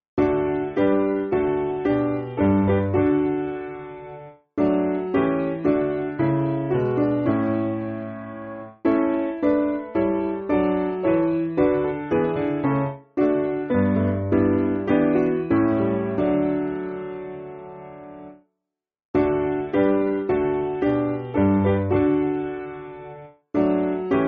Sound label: Piano, Music Backing Files for Churches